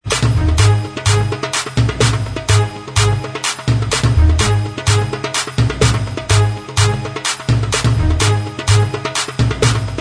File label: Inst Track